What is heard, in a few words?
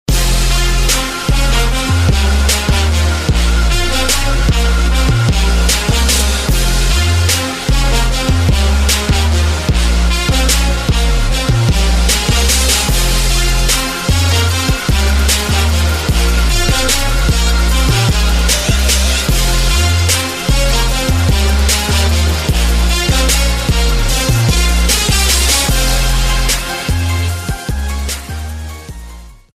Trap & bass mix sound